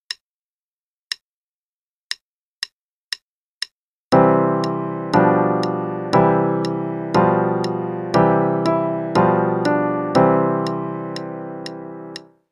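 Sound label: Harmonizing with Blocked Chords (quarter note=120); Level 2B - 2nd Ed; Faber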